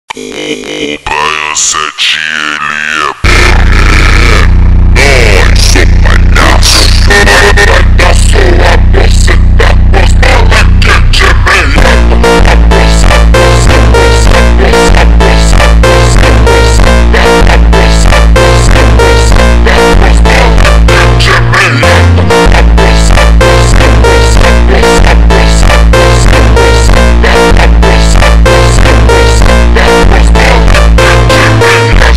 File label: super slowed reverb brazilian phonk